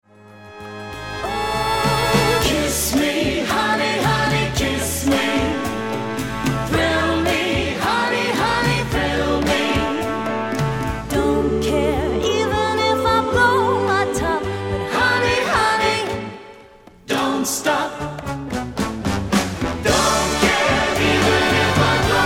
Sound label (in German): Swing; Kür